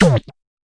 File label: fx drum, Electro FX